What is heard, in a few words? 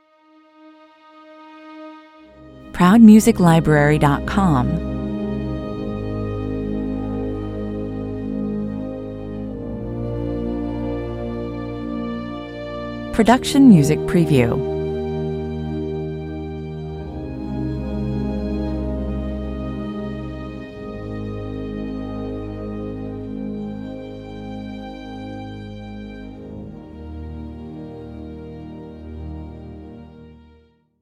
strings only